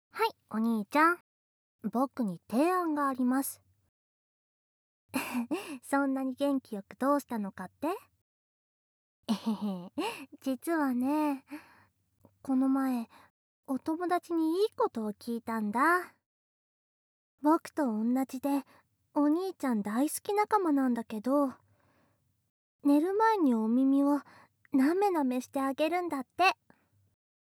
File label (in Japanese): おまけトラック・耳舐め右耳